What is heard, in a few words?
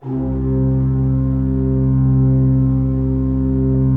C2, Cathedral Organ; ILIO; Propeller Islands